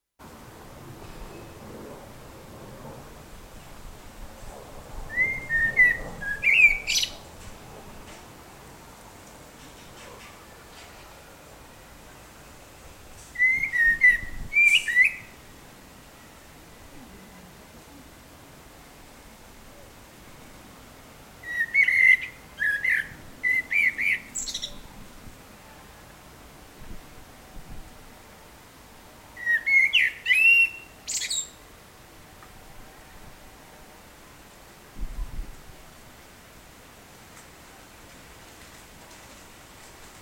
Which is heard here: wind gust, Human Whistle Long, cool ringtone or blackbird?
blackbird